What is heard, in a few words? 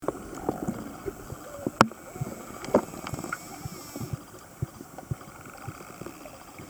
cricks; dolphin